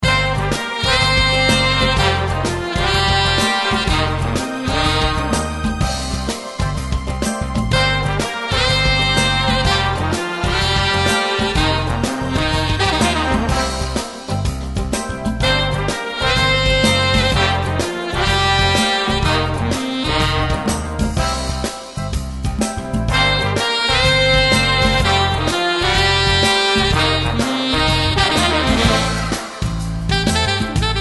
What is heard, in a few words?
Shuffle Bop